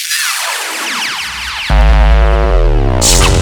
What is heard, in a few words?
Loops Fills; Vengeance Samples